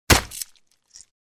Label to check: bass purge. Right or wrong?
Wrong